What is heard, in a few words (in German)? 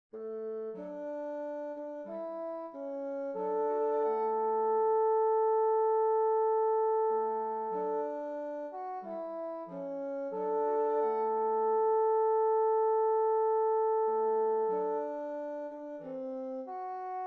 Horn